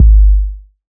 808's

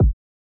Kicks

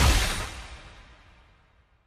Head Chopped Off; Gluttony

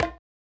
percs

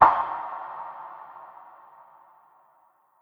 Snares

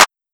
Clap

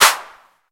Claps

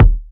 Kick

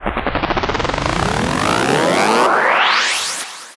FX UP

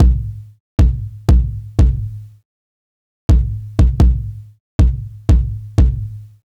Unison Jazz 120bpm Kick

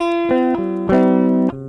gtr

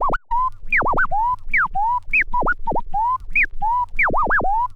Scratch

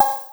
Perc

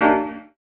FX

